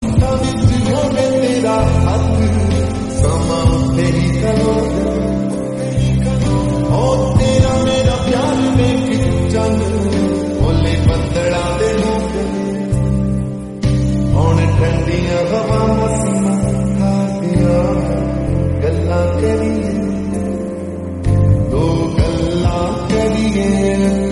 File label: slowed